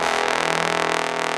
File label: GROWL BONE; AKAI S-Series CD-ROM Sound Library VOL-2; ORCH